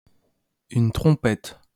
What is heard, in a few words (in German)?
trompete